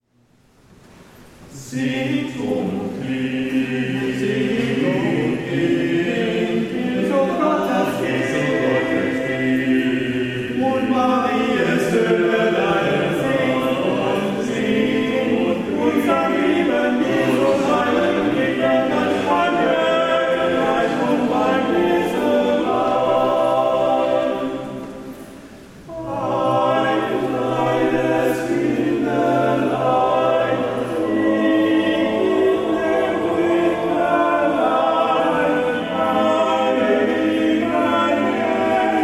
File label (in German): Singt und klingt; Hl. Abend